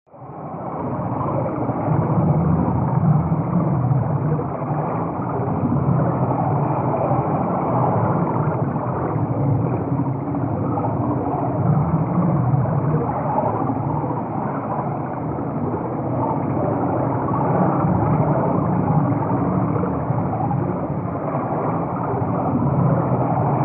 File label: Deep Sea Underwater; bubbles